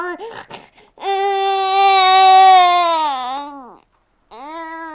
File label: BABY CRY; East West; Global Sounds; BABIES